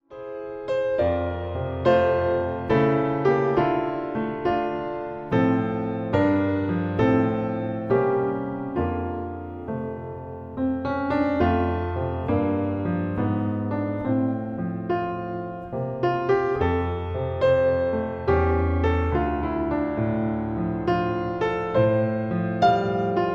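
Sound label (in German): Choräle am Piano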